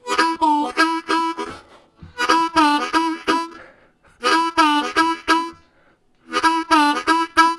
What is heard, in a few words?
Choo Choo, Harmonica Riffs; Zero G - Harmonica